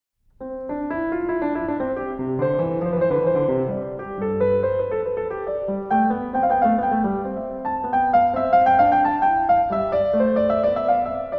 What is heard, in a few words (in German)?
Einstimmige Inventionen I C-Dur, Klavier; Inventions; BWV 772a